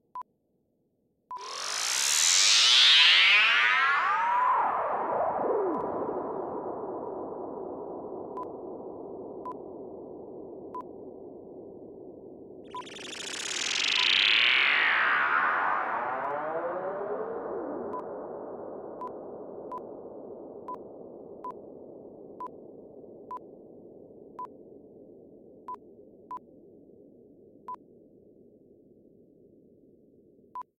Zapper Whoosh

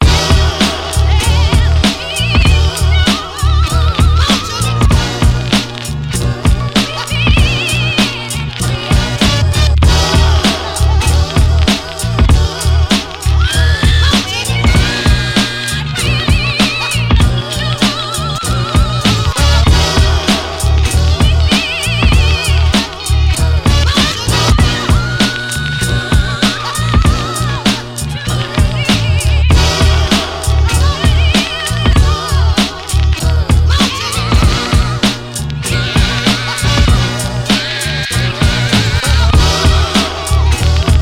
beat

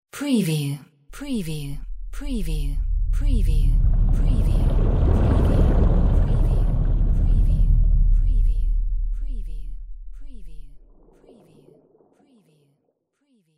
WATER

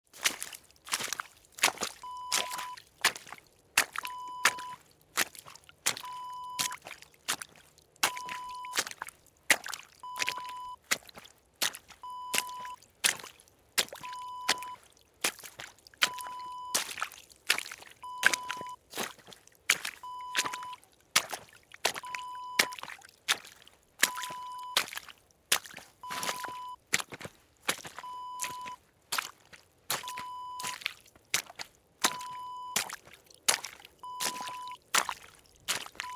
footsteps in water

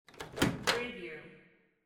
microwave oven door open

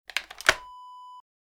shotgun action open

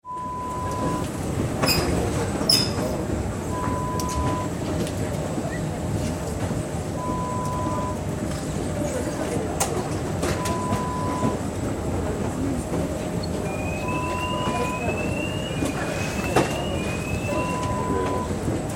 Baggage carousel sound

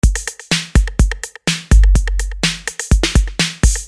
beat

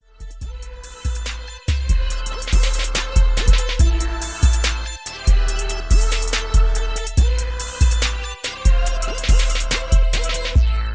71BPM

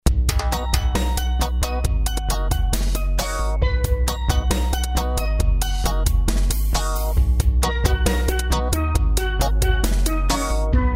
reggae

